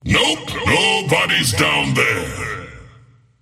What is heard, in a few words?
swipe; skel arc; dota; skeleton king